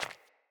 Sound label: Sandals Run Default, Footsteps; Player